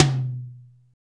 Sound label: ACU TOM MID, drum, tom